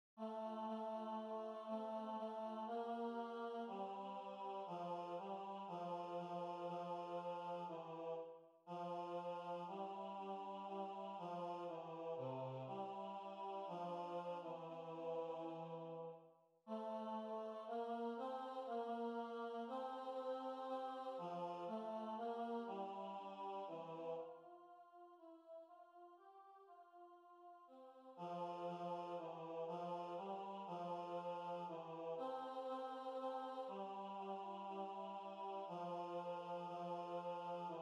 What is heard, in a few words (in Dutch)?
Komt allen tezamen tenor